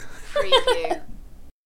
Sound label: Yng Lady Laugh